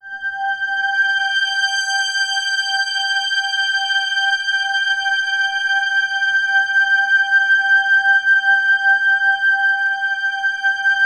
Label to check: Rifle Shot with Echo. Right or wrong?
Wrong